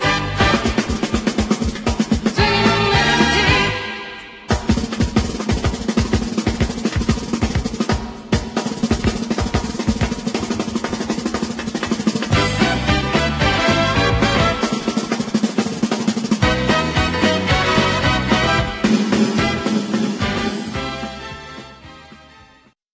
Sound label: head on drum